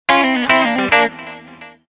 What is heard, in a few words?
country